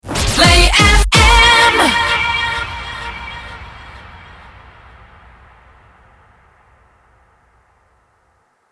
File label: 128bpm Bb min Rapid Fire